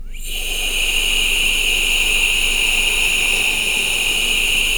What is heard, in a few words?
E NOISE BED, VOCAL WIND; ILIO; Vocal Planet; Jazz & FX